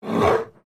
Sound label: Swan Giant Breaths